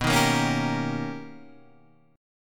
B Augmented Major 9th B Guitar Half Step Down, chord; B+M9